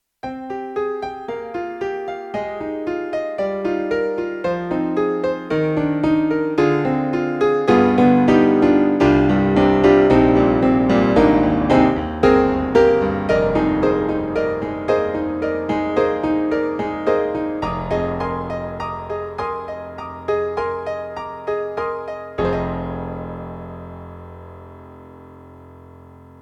Yamaha MU1000EX Old Piano

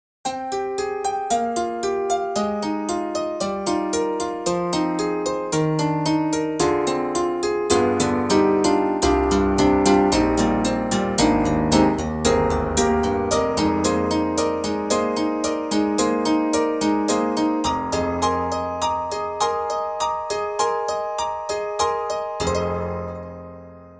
HALion Sonic SE Tack Piano; Steinberg

